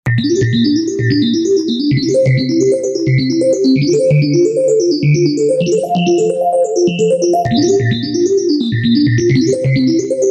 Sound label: epiano